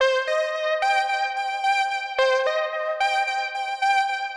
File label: Synth 110BPM